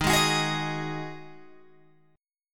Eb Minor Double Flat 5th Eb Guitar Open G

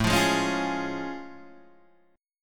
A Augmented Major 7th A Guitar DADGAD

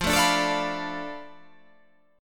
F 6th Flat 5th F down, chord

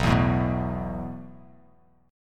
B 7th Suspended 4th B down; B7sus4; strummed; JGuitar

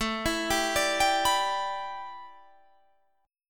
A 7th Suspended 2nd Suspended 4th Guitar Standard; A7sus2sus4 arpeggiated

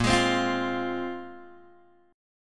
Bb 7th Suspended 2nd Bb, Guitar Open G

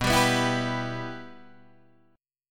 B Suspended 4th Sharp 5th B Guitar Standard; Bsus4#5 strummed; JGuitar

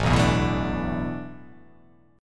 A Suspended 2nd Suspended 4th; Asus2sus4 strummed

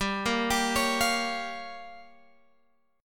G Minor 7th Flat 5th, Guitar Open G

